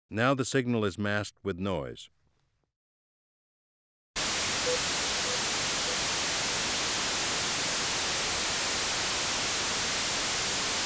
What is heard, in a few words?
Masking level differences, Auditory Demonstrations